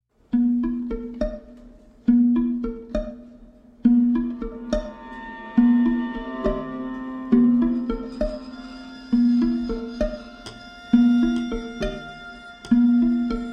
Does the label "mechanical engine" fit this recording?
No